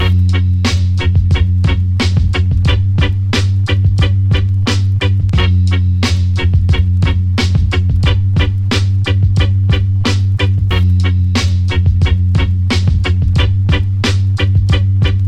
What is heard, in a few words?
ringtone